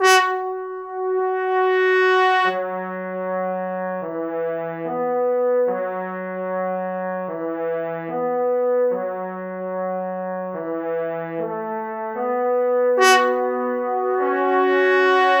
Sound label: Horns Intro, Cinematic Funk Urban Tone Poems; Sonic Foundry (Sony Creative Software)